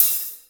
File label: Misc Hat